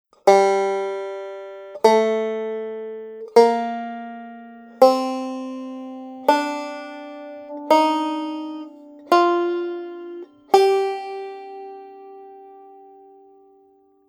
G Modal Scale

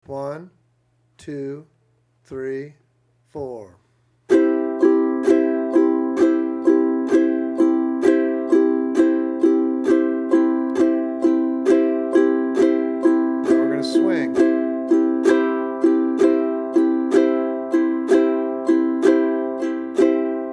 How to Swing the Rhythm

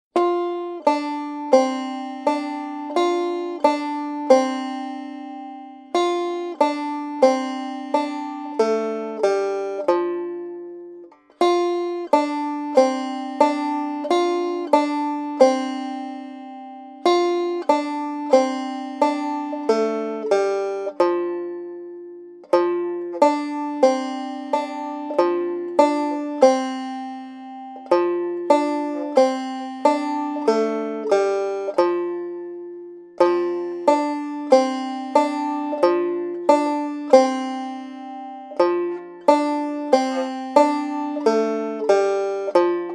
Melody; Shortening Bread

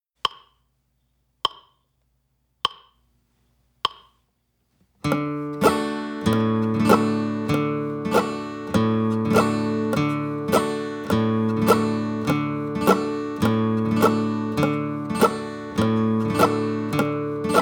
Air Mandolin

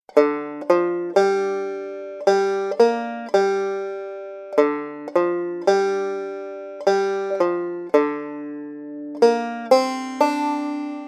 Melody; Sweet Sunny South

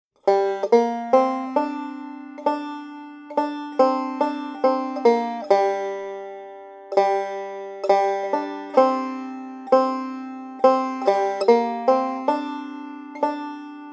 Melody; Poor Wayfaring Stranger

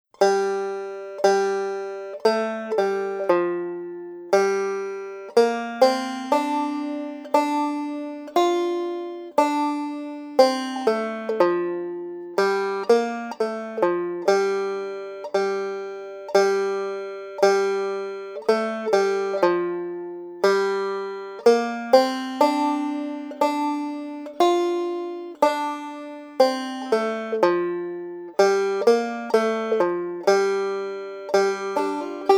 Melody; Shady Grove

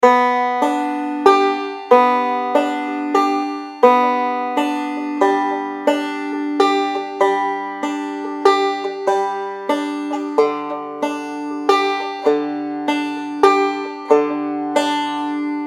Forward Roll

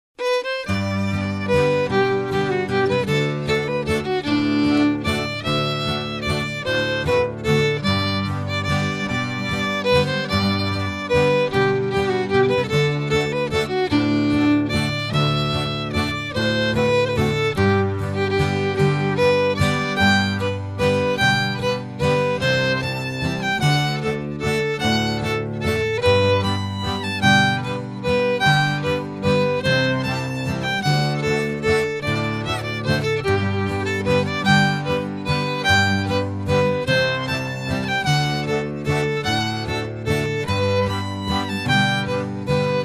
waltz; Lauterbach

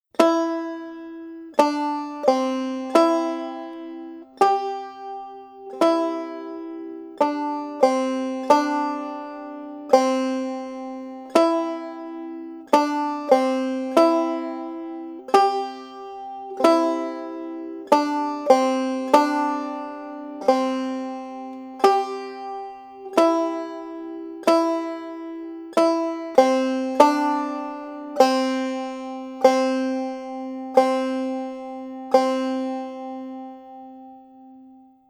Melody; Old Blue

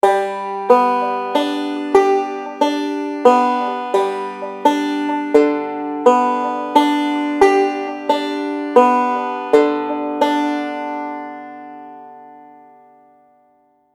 Reverse Roll

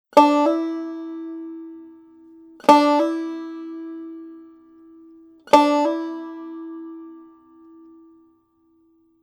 Hammer On Melody

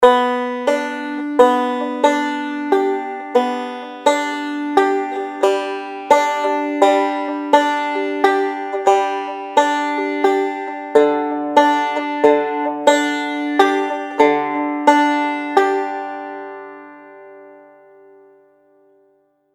Roll

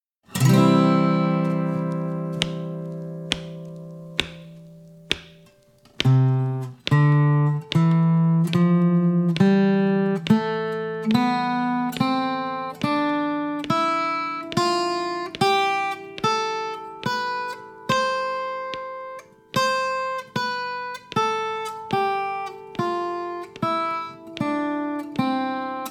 C Scale

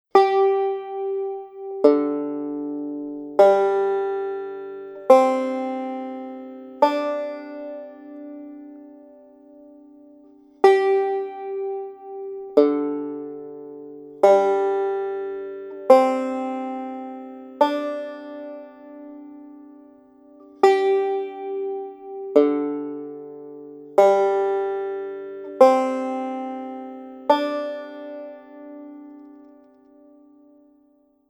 Sawmill Or G Modal Tuning gDGC

